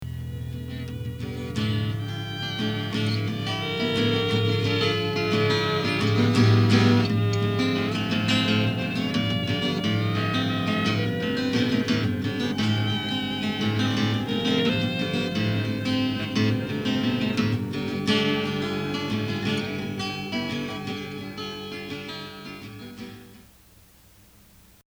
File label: Organ jam